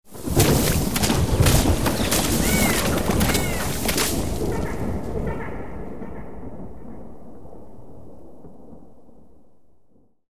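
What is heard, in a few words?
Event Dream Ball Splat Rain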